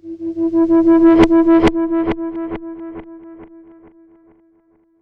FX DIST; FX DISTO

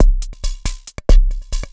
percussion loops, Techno, 138

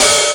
hat open mid loose nasty, Chicago House Drum Hits

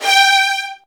G3, Virtuoso Strings; ILIO; Kirk Hunter Virtuoso Strings VOL-1

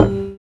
PNO, Electrics, Rhodes

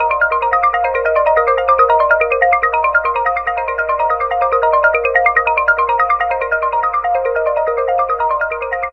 ambient sequence F 147

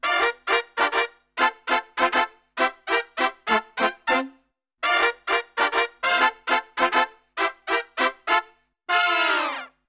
BRASIL, Brass; Best Service; Brass Super Section; BRASIL LICK

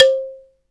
BALA, Balaphone

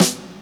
SD, Dance Drums

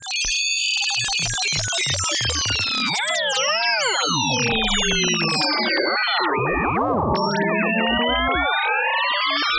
random sines

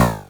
Drums, Hits; DCM8

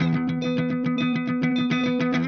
Clean Funk Guitar

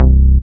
BAS, Eurotech; Sounds Good

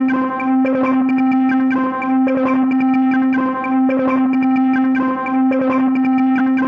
Crude Tape Loop, Tape delay; radiosonics

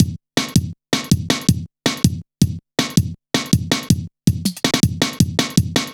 Tinkle, drum loop, jungle, 161.5

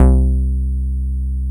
C2, BASSES

